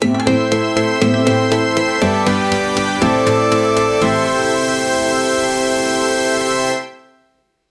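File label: a final celebratory fanfare, slot machine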